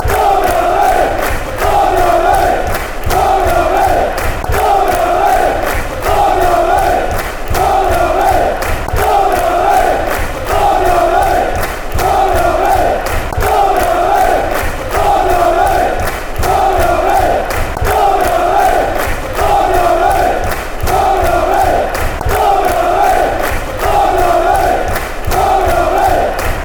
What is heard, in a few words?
barmy army